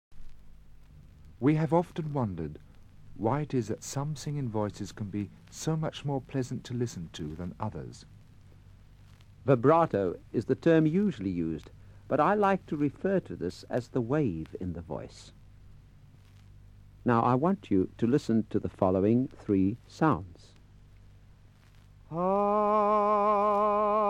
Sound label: Vibrato